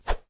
hand swing

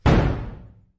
pillow destroy

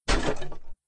wood destroy

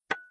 stone impact

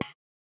drag

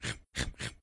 eat

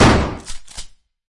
shotgun shot

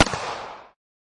9mm shot

